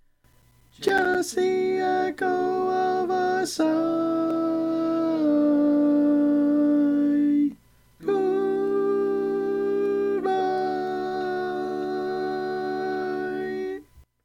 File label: lead